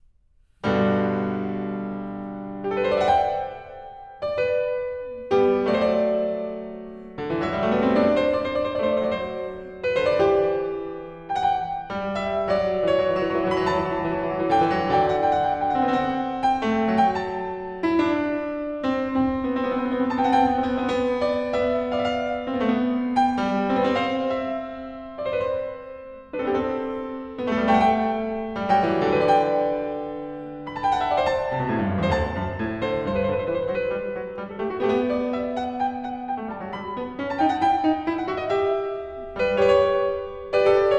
Bach Goldberg Variations BWV988 Variation 16 - Ouverture (Maestoso - Allegretto)